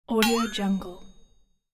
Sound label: short flexatone comedy accent